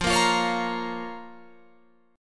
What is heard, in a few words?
F Suspended 4th Sharp 5th F, Guitar Open F; Fsus4#5; strummed